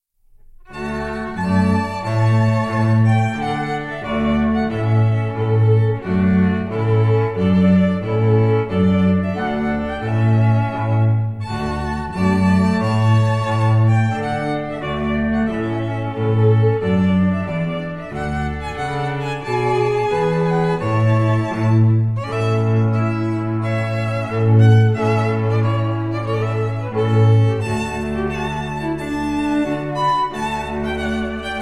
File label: Trumpet Tune; Purcell